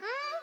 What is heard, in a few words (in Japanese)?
ん！？